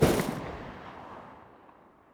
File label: m16a2; weapons